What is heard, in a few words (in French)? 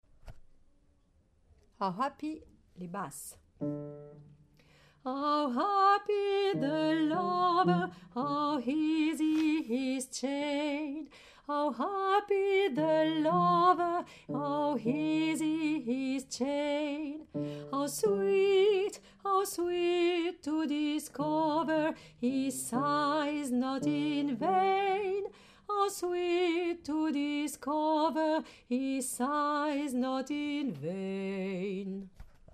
Basse